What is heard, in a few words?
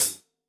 hihat closed, gretsch kit